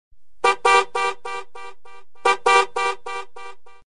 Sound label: Truck 3d Horn